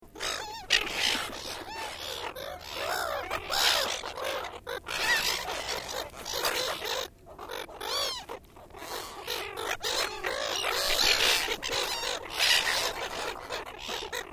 BIRD SEAGULLS WILLIAMSTOWN LARGE GROUP FEEDING LOOP, Australian Birds; Animals